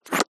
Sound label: animals lizard tongue eat insect